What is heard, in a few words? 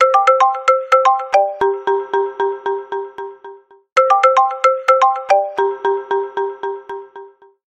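huawei, ringtone; suonerie; 2021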